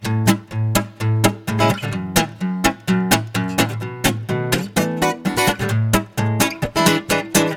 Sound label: Loop Guit; carte blanche for a fistful of teeth; windows gamerip 2006